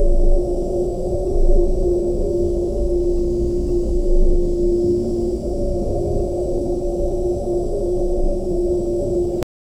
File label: looping ambient loop of a, bunker